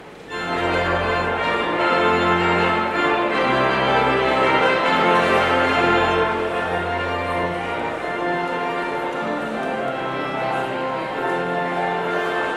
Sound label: Postlude Gavotte; Praetorius